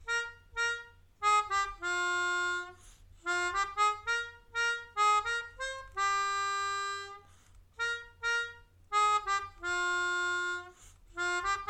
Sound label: Moorea, Melodica